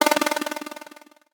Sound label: flutter notification